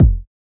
tight bass drum one shot F sharp key; kick drum; kick drum samples

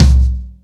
rich high end kick one shot c key

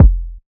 tight low end bass drum sample f key; drum single hits one shots; kick drum samples

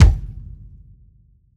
huge bass drum one shot f sharp key; Bitdepth 24

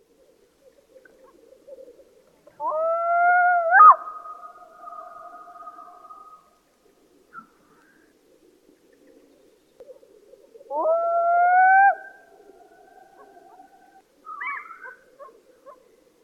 black throated loon bird echo

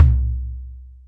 low floor tom drum single hit c key

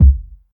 tight kick sample c sharp key

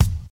bright kick f key, Loudest frequency: 742Hz; kick drum samples

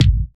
smooth urban kickdrum sound g sharp key

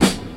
modern clean acoustic snare b key

